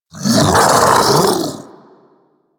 Infested Splitter Vocal Attack Medium; Warframe; Grey Strain

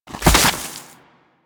Ice Proj Fire; Enemies; Abilities; Warframe; Corpus; Arachnoid Camper Terra

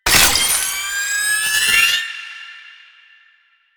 Sniper Drone Charge Up; Enemies; Drones; Warframe; Lasrian

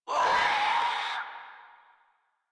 Infested Crawler Attack Short; Warframe

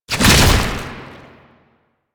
Tank Infested Charge Tentacle Whip; Enemies; Abilities; Warframe; Lasrian

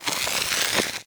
Blind Bow Draw; Powers; Fey; Saboteur

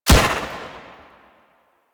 Fairy Weapon Shot; DE; Warframe

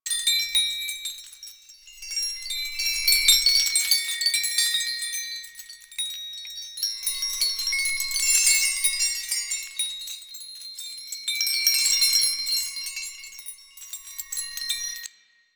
Chime Loop; Warframe; Duviri; Easter Eggs

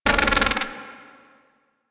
Bird Chuff, Ambience; bird three chuff

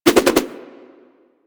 Nunchaku Spin Whoosh; Warframe; Tenno

